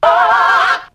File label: "oh", group sung; ooh ahh